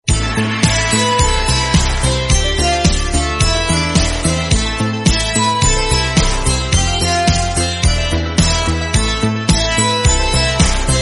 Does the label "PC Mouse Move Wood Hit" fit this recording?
No